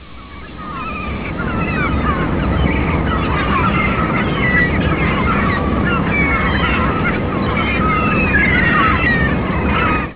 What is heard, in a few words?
seagull